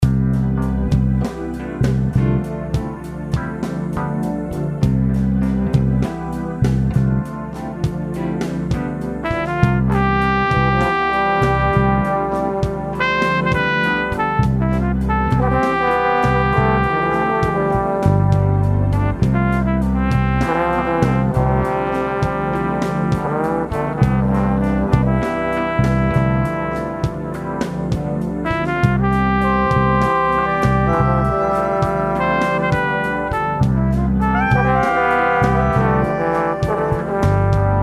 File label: Pop Ballad